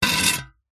industrial shovel spade metal short stab scrape on concrete; zapsplat